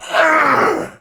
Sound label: Pain; Warframe; Tau; Dax; Ashigaru